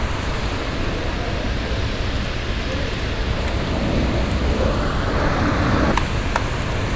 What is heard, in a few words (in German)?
Regen